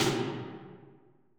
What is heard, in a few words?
Snare